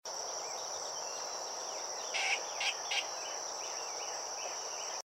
Least bittern; پرنده بوتیمار کمینه

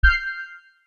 Digital button

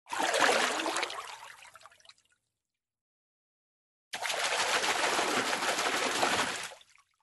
fish splashing sound effect

